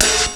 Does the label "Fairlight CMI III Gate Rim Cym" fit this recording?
Yes